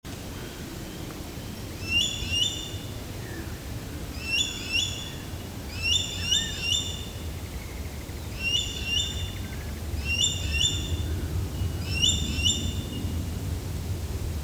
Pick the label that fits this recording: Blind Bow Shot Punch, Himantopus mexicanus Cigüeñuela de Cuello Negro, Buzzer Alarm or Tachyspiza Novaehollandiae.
Tachyspiza Novaehollandiae